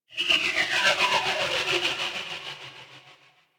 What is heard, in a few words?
Gas City Distant Textures; Ambience; Warframe; Corpus Gas City